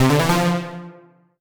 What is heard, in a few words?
Solo Warm Synth Positive; MUSIC EFFECT; startide